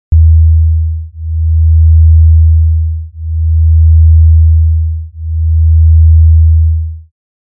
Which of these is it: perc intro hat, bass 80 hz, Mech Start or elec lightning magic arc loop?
bass 80 hz